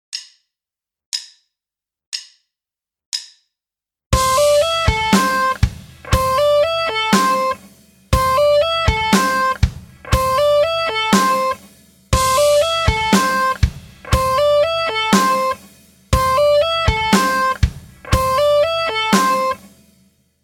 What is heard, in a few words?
pentatonics, guitar; 30 day guitar challenge